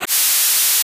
Swoosh

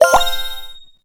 Jingle, Lucky Ticket; rayman legends the complete soundtrack gamerip 2013

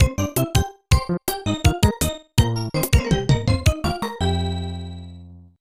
Unused Jingle; Super Ninja-Kun 1994 SNES

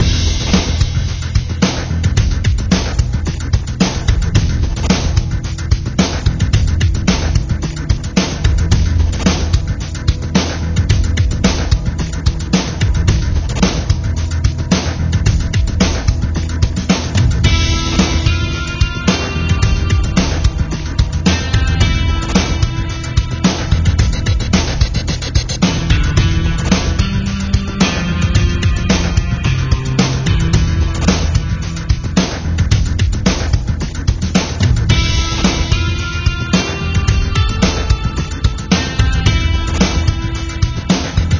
Tension Loop

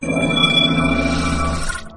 mutate sound; Caravan Beast

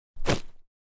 Equip; out of reach treasure royale 2020 gamerip pc

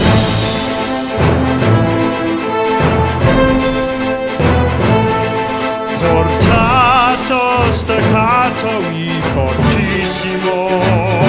Boss Music; Henry Hatsworth in the Puzzling Adventure; 2009 nds gamerip; Banson

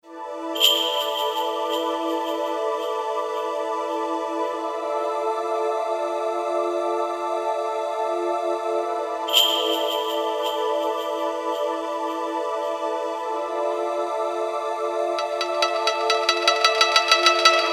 bgm forest morning; Naruto Shippuden: Clash of Ninja Revolution III; Wii gamerip

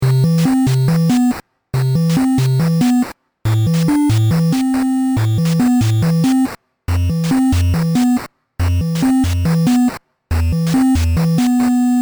Quiet Town (8-bit); Lenna's Inception

